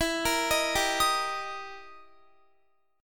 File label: E Minor Major 7th Flat 5th E down Guitar; arpeggiated; EmM7b5; 4E,4A,5D,5G,5C,6F